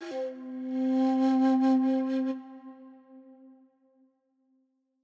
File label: shakuhachi note C